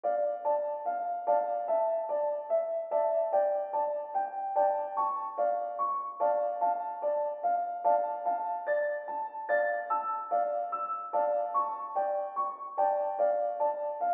beat

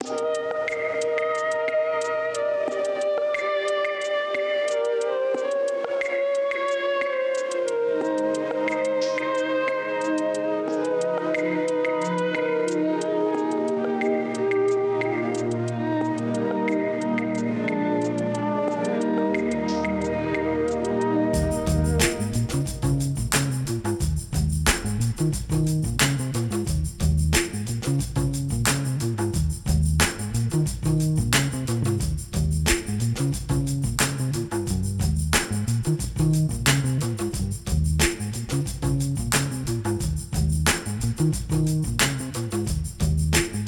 mellotron motown 90

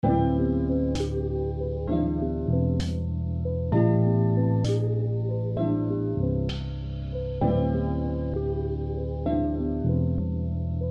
lofi

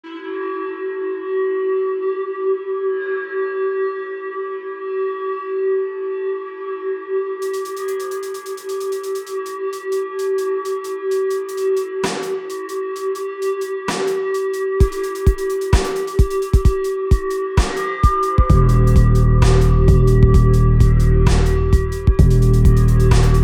trap

